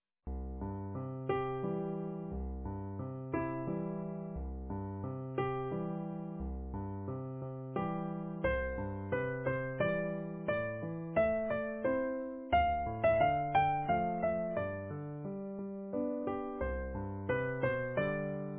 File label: easy piano